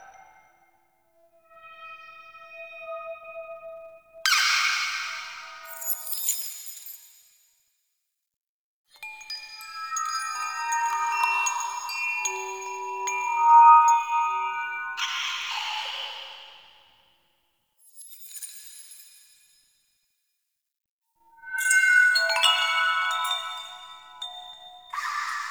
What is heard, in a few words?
Large Bed Amb, Colony; Warframe; Ambience; Nokko Colony